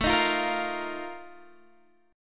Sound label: Bb Major 7th Suspended 4th